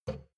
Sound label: shot glass grab table; berufe; soundpool